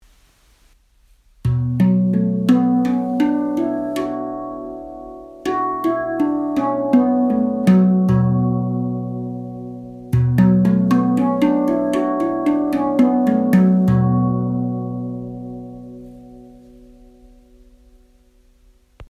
handpan pantam celtic minor

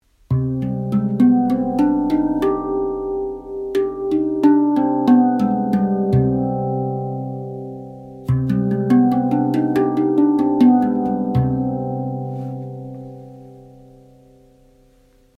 handpan low hitzaz

